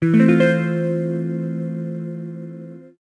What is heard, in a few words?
Guitar